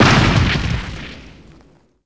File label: Helicopter Rocket Explosion; Red Shark